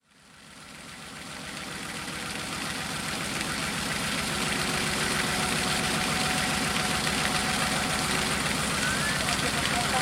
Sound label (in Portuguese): Riacho e tractor; Covas do Monte